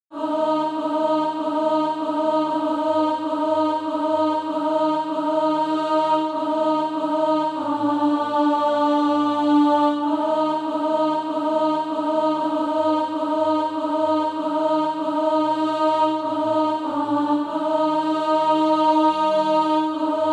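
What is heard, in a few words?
alto, Practise Music; Footprints of Jesus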